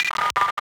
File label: Glitches; Antidote Advent